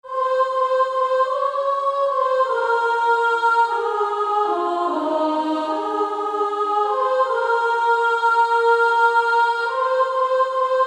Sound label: Female Choir S2; Only a step to Jesus